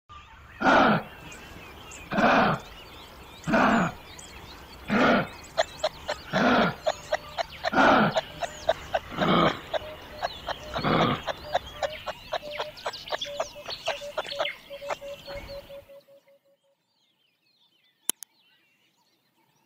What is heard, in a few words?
Wallaby; والابی